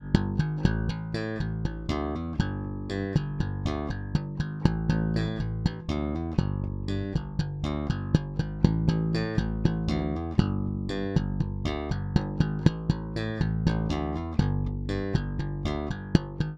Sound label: bass slap on